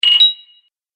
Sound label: Collect Coins Rapid Speed, Video Game Sound Effects